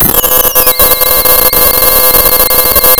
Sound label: Sound of tearing paper, center